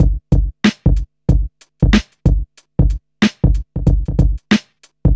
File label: 93 bpm drum loop sample g key